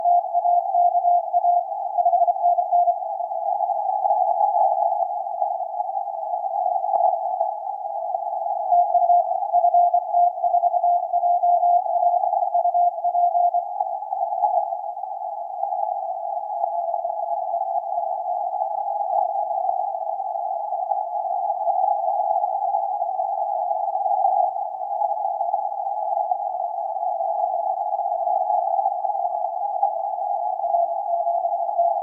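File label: 160m, signals on 160m band; ft4ja; RAØFF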